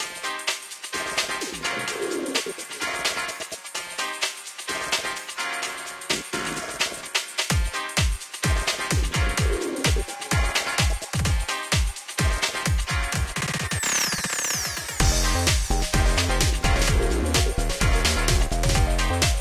bgm craft time

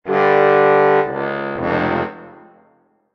combat loss; heretic operative

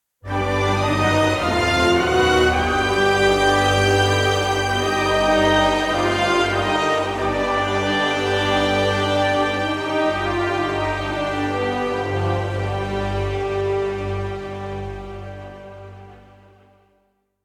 Boss victory stinger Music

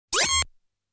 Item Collect Sound; 1500 series collectors box toaplan 1993

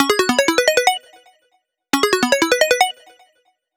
Alert; Radirgy 2

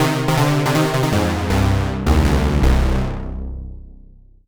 Solo Warm Synth Negative

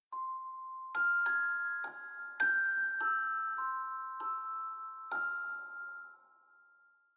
Start music

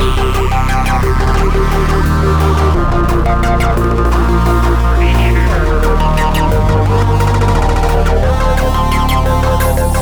Asteroids Inside Medium; rive wreck hack die retry; ps4

